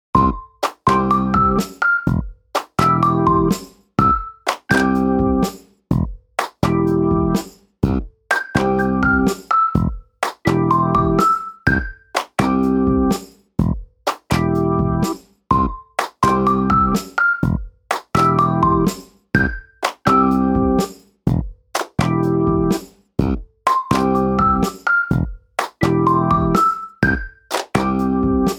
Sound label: Ringtone; Vivo Y11 (2019)